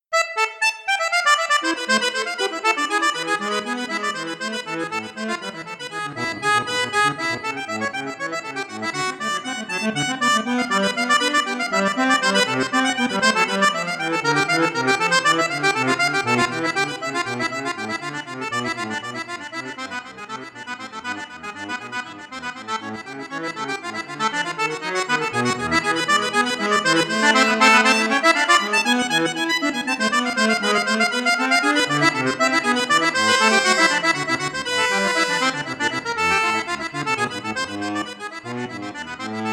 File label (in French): registre 16 4; cavagnolo artiste 3